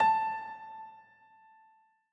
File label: dial tone piano